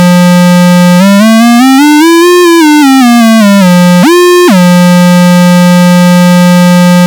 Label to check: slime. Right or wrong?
Wrong